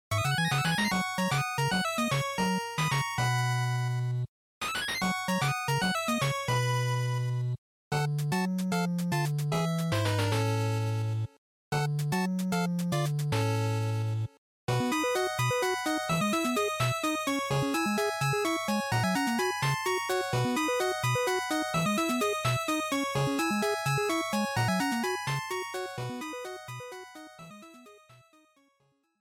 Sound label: NES Action